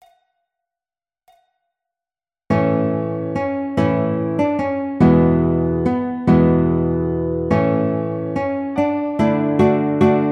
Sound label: Heidenroslein Guitar